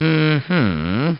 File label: humm; BattleSNDS